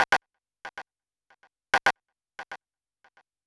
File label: TAC FX, Techno 138 BPM; Best Service ProSamples vol.54; AKAI